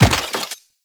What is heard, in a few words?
Weapon Liberty Launcher Fire; Warframe; Caliber Chicks Minigame; Weapons